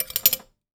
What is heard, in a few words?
Tea Spoons, household rhythm samples hits